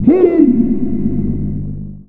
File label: VOX FX; Big Beat Samples & More